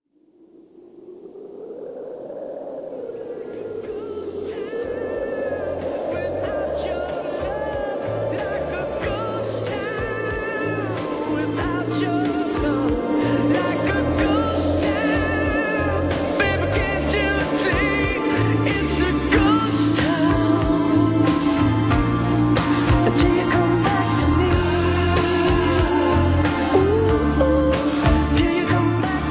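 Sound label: ghosts wind; spawngirlsounds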